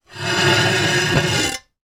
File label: foley mirror no frame drag on table; sound effects one